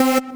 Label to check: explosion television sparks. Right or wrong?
Wrong